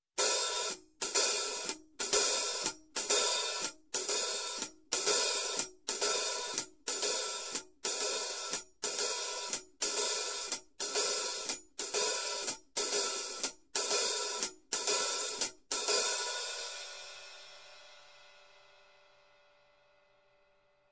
Jazz Shuffle Hi Hat Pattern

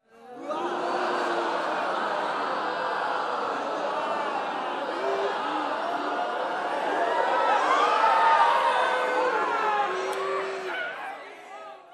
Crowd astonished reaction

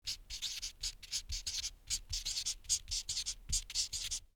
Taking notes with marker sound

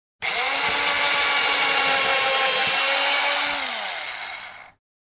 Drilling into a Wall

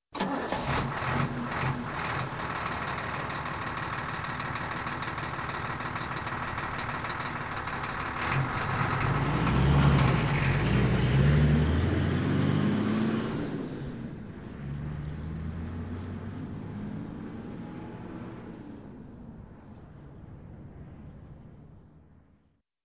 Truck engine ignition and drive away

